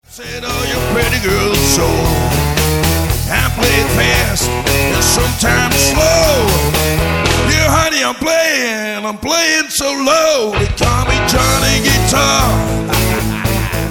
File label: guitar, blues